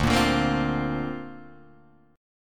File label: D-Minor 7th Sharp 5th D Guitar Open G, chord